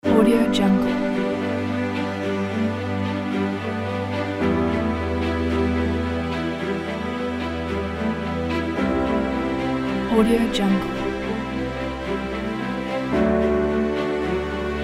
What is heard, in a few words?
soft orchestral background